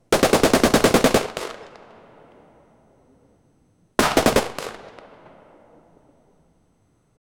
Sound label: shootout in brazil 9 mm